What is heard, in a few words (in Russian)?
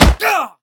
korotkij zloj grubij krik ot popadaniya bitoj po bejsbolnomu myachu